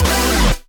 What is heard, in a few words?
C maj7 saw chord; lead arp chord melody